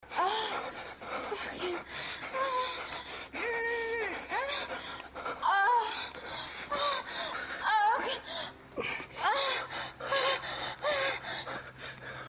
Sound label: cum, moans; The Witcher Hunt